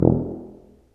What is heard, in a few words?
TUBA, Tuba stacc; Roland L-CDX-03 Disk 2